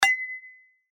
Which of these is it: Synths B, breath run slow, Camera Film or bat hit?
bat hit